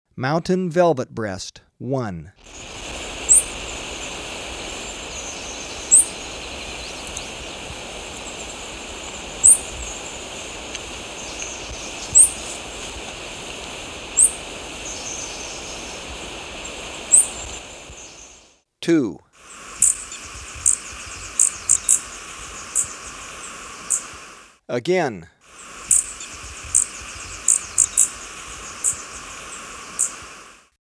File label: Mountain Velvetbreast; S. America